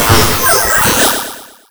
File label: Retro Magic Electric